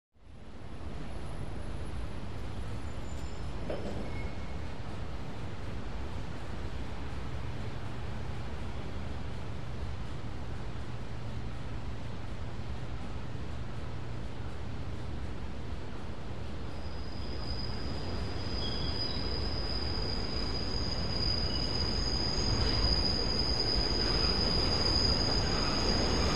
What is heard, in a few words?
El Train Exterior By